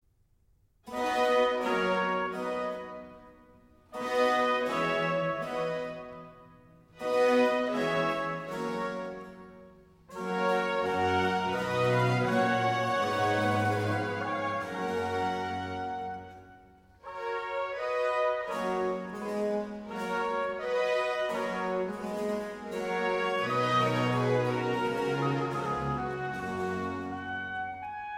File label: Symphony; Henry Purcell; Semi-opera